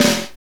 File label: snare, R&B Snares, Roland; Northstar - Drumscapes Roland